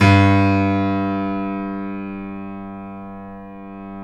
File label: KEY, Steinway ff; Roland L-CD701